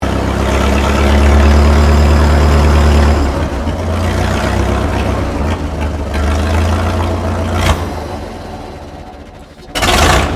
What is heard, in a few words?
Tractor Mccormick Deering W6 Rev, Old Farm Tractor; Tractors; Vehicles; 1942